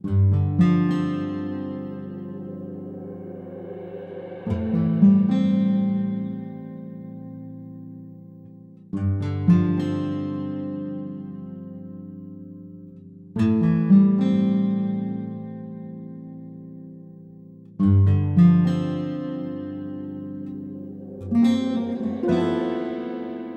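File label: bugcity lowkey loop 108bpm A minor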